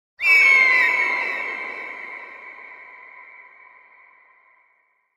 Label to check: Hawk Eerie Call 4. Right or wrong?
Right